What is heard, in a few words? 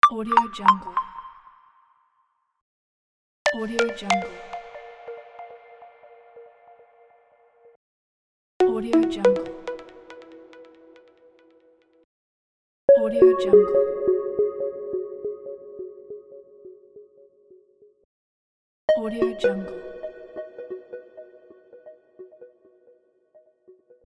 notify sounds; pashastriker